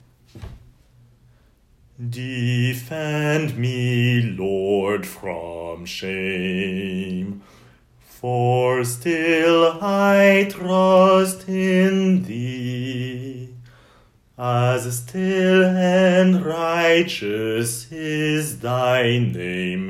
basses defend me

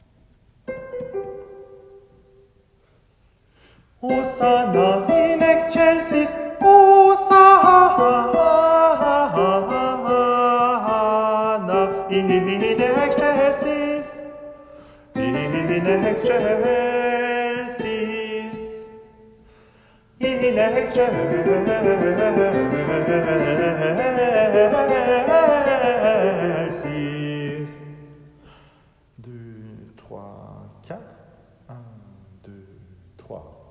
soprano; osanna

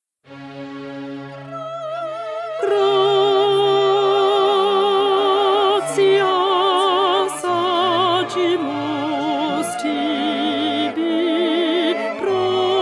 alto; mozart mass in c minor; gratias

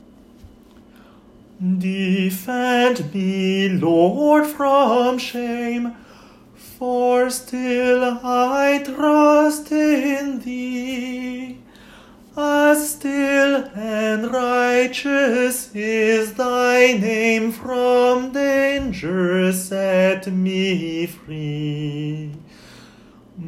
tenor defend me

